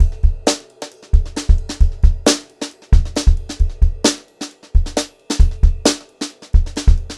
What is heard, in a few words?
Brushed Drum Loops; USB Soundscan vol.39; AKAI; 133 HOTROD